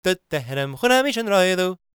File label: GAELIC, World Voices; ILIO; Vocal Planet